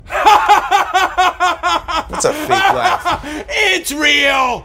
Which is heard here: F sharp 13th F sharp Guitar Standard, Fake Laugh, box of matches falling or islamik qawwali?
Fake Laugh